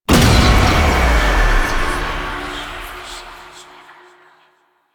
Apparition Impact; Warframe; Dagath

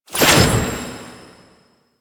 Rune Pickup; Warframe; Entrati; Mirror Defense

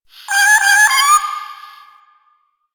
Cat Loot Crate Meow; Warframe; Nineteeen Ninety Nine; Cat Loot Crate Common

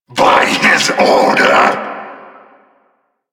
Vocal Attack; Warframe; Duviri; Dax; Vocal Set B

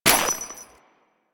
Step Ice, footsteps

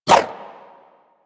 Inf Throwing Star Whoosh, Infested; Warframe; Weapons

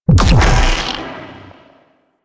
Briefcase Launcher Explosion; Warframe; Weapons; Corpus

